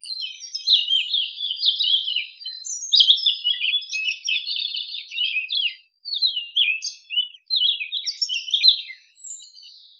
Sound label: soft and soothing bird ch; birds